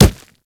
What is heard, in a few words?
Kubrow Run Dirt, Footsteps; Enemies; Warframe